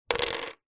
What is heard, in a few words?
foley wood blocks small x4 set down together on wood table